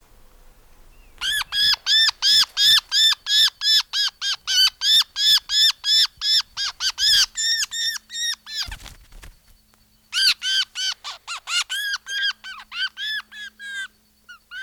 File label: Catharus ustulatus Zorzalito de Swainson